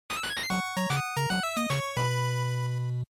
Action, Clear; NES; bgm

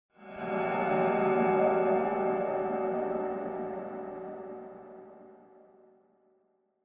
Horror Accent Low Short

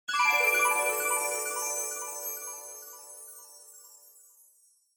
Angel Timbre

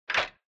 Retro Anime Switch Low

